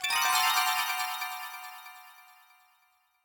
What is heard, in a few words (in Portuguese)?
Morte Cristais